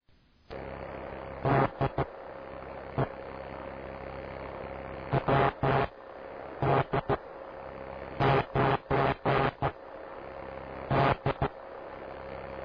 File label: Spark simulation